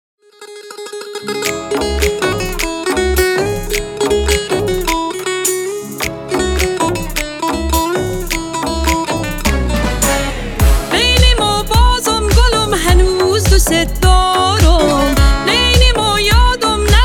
noroz remix

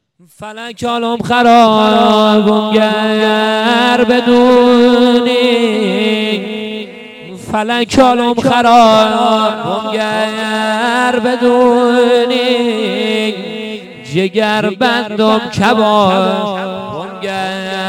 lori ghamgin khoram abadi

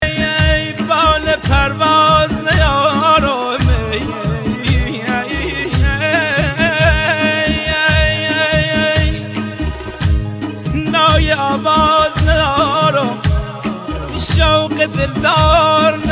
lori ghamgin khoram abadi

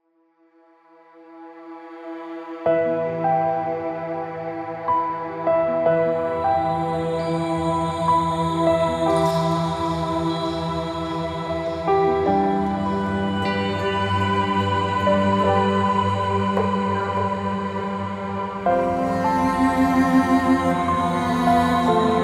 pop

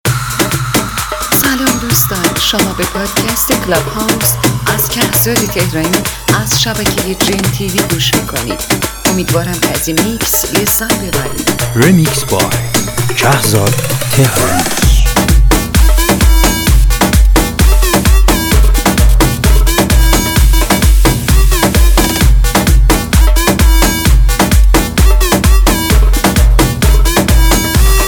kordi ghamgin

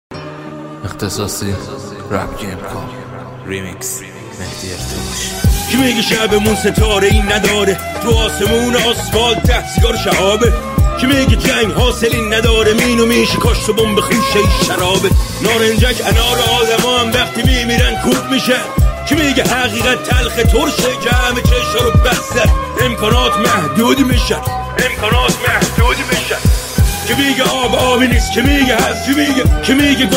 remix rap

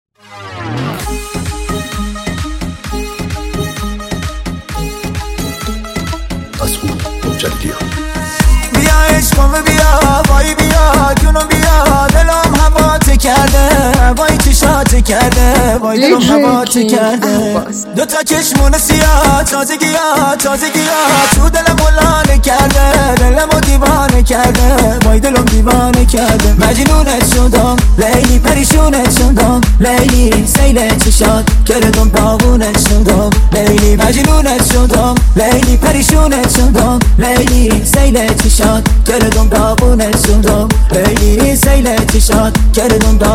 remix remix

